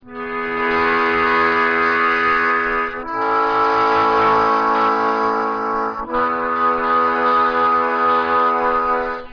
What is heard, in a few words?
breathe thru, harmonica